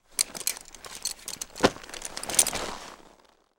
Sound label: Animal Horse Saddle Move; animals; AdobeCC